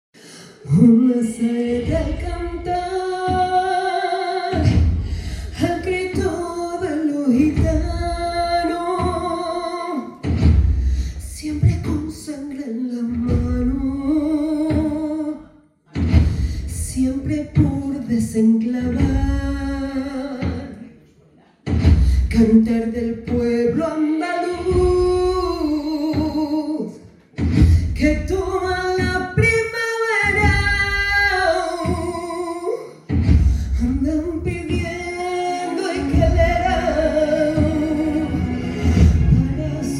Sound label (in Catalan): cantando, cants populars de setmana santa; els cants populars de setmana santa ressonen a Olesa